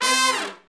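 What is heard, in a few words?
short fall, brass; Roland L-CDX-03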